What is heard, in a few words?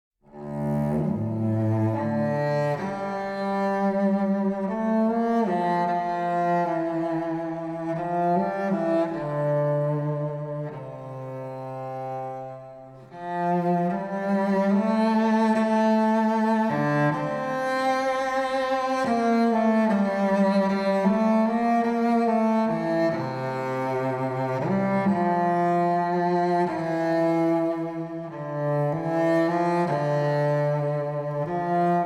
Violoncello ver.; Sword Art Online Complete Music Collection; Aincrad Arc